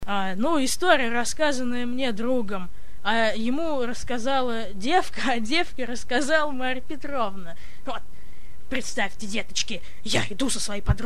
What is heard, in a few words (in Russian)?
poezd